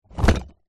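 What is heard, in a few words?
foley box of plaster pieces set down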